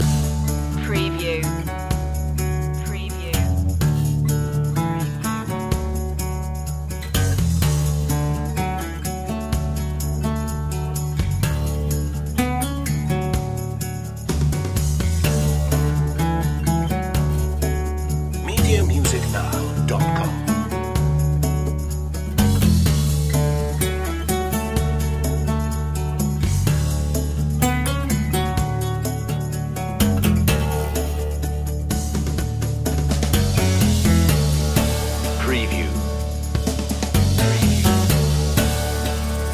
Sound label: home comforts loop